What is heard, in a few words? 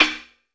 FX SLPSTCK; AKAI S-Series CD-ROM Sound Library VOL-5; FX PERC